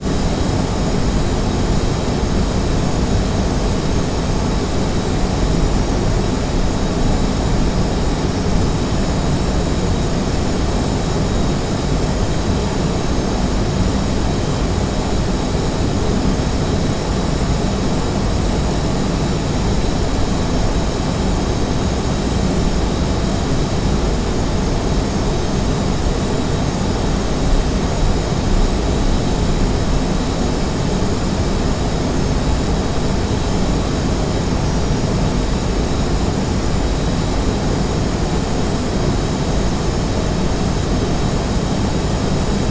office room tone computer noise airy air conditioning; tom chapman